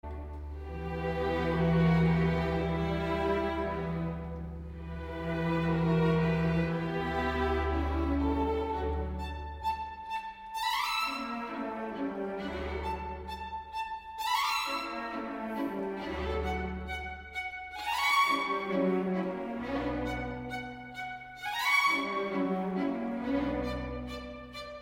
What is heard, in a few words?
String Sonata in D Major III. Tempesta Allegro